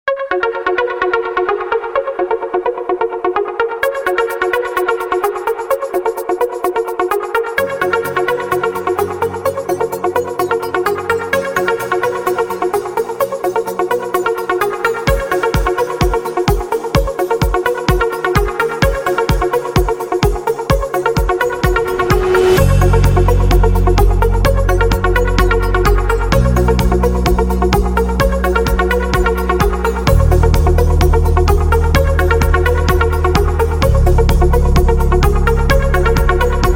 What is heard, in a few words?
Good Vibe